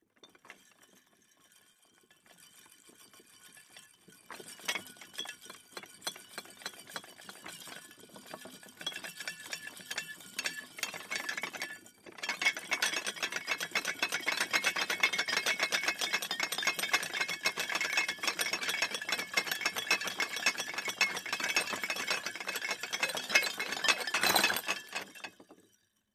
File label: Dishware Glasses Rat, earthquakes